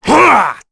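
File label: Vox Attack, Korean; KaselB; kingsraid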